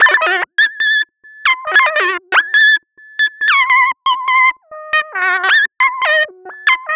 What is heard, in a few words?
Bpm138 Sign Synth FX Loop Am; Dubstep; FX Loops